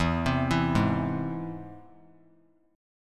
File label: E Augmented; E+ arpeggiated; JGuitar